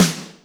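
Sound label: Snare Darker, Alesis D4 drum machine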